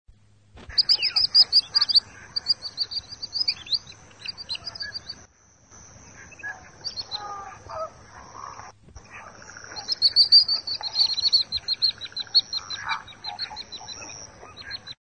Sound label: common sandpiper; birds